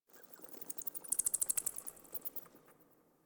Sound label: Insect Night; Warframe; Ambience; Eidolon Plains; One Offs